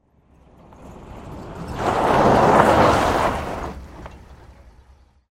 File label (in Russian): rezkoe tormozhenie i ostanovka po graviju